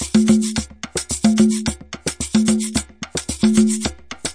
110 bpm, afro cuban scritchy, drum loops and breaks